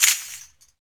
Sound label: RAKATA, Afro Shakers, Ankle Pods; Roland LCDP14 Africa VOL-2